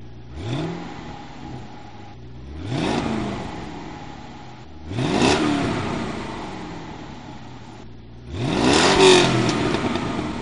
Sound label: stock 2005 GTO